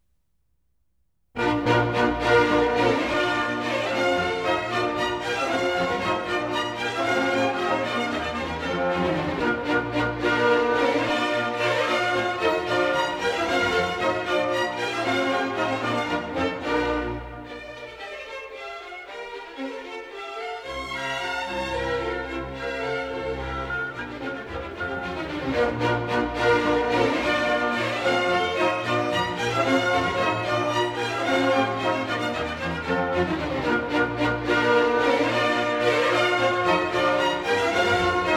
Symphony in B flat major: Molto allegro; Mozart

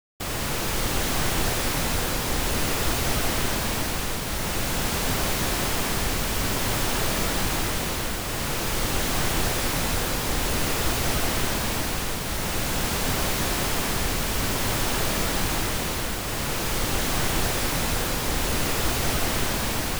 Continuous wideband Surround-Sound frontal pan; Surround Sound Setup and Imaging; Surround Spectacular

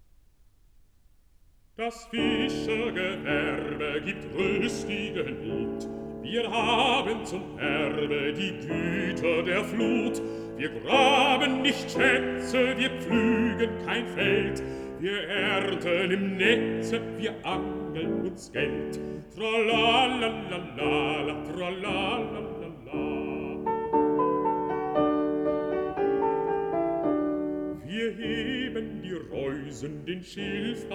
song for voice & piano

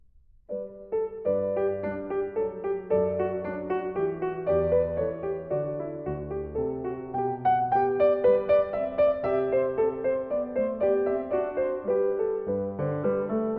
Variations, for keyboard

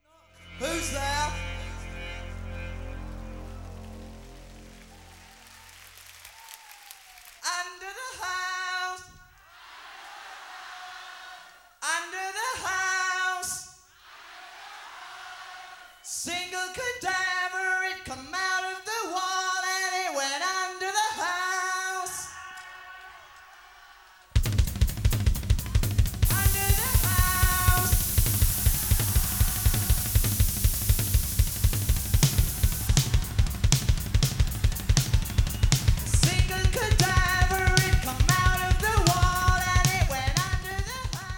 Rock (Stereo); Denon Audio Technical CD

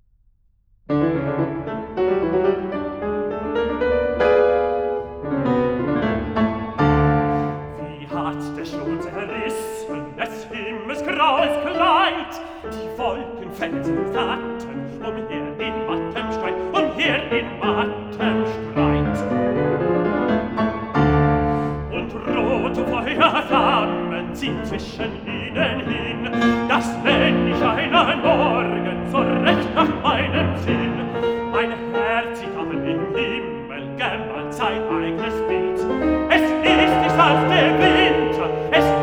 song cycle for voice & piano; Schubert; Winterreise

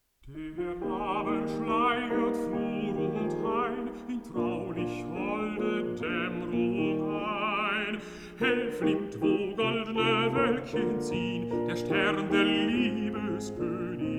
song for voice & piano

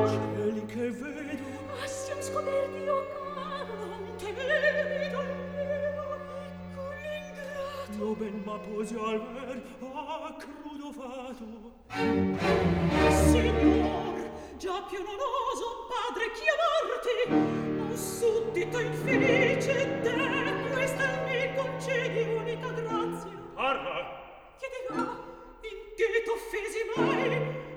opera, Recitativo

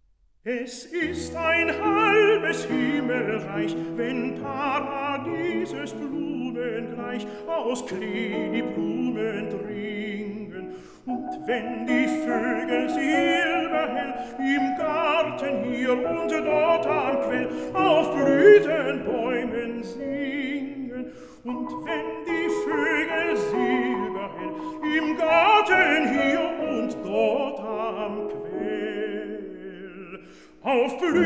song for voice & piano

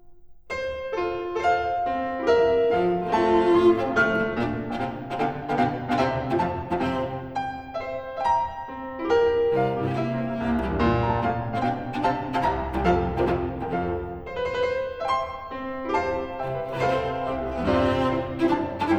Variations for cello & piano in F major

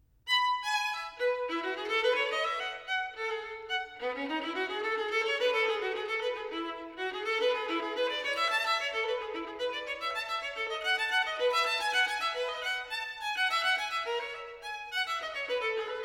Partita for solo violin No. 3 in E major, Gigue; BWV 1006

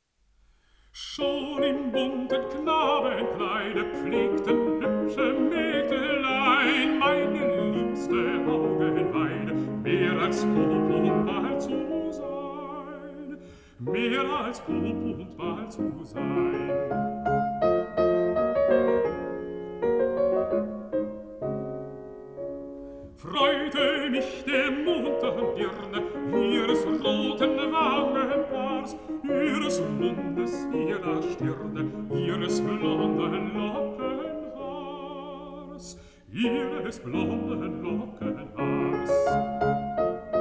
song for voice & piano

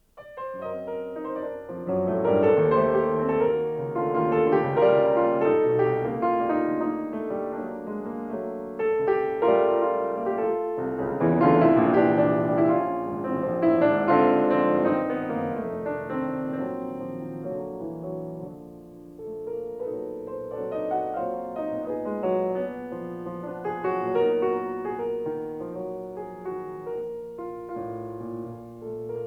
Preludes for piano, in G Minor; Scriabin

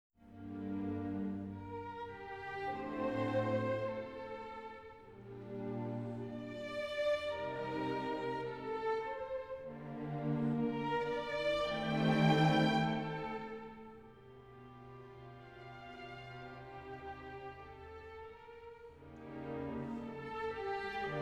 Enigma Variations, Andante; Elgar

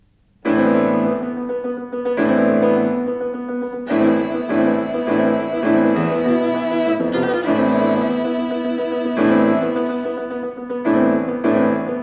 violin & piano; Suite Populaire Espagnole; Jacqueline Du Pré

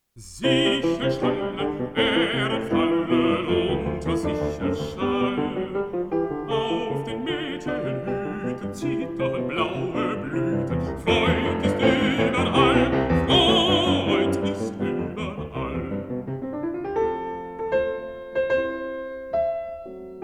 song for voice & piano

